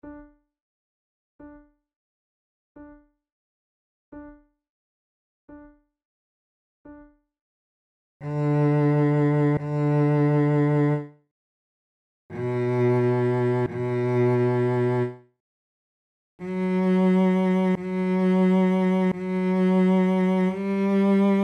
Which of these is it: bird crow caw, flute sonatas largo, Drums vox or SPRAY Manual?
flute sonatas largo